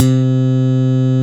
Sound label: Slap Bass D2